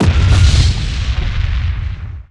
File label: Big Explosion; 1944 Battle Of The Bulge